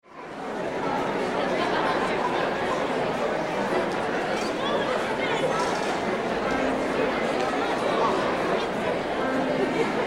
Ambience Church Before Service Piano Notes In Background